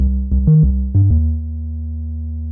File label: round bassline